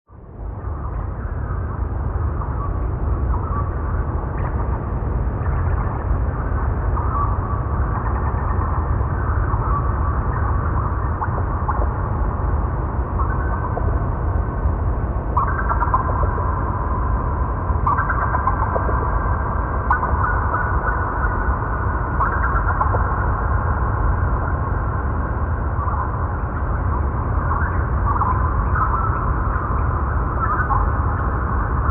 Forest Drone

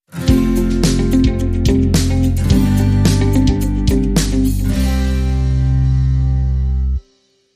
Acoustic Inspiration Sting

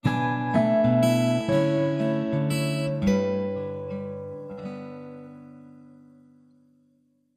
Orchestral

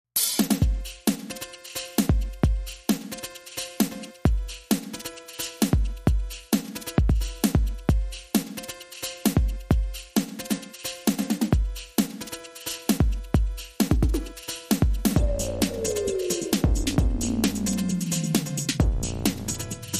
Bonus Beats

